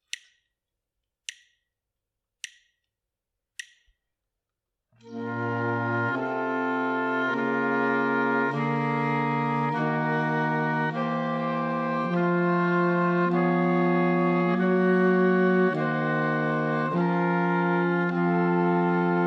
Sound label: harmonic dictation; eartraining